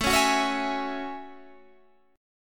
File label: Gb Minor 9th